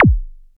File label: Roland SH-09 Bassdrum